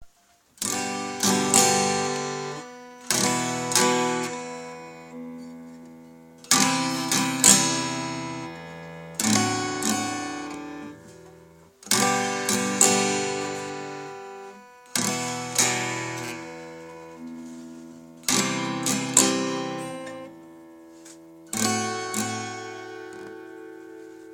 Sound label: killing a guitar softly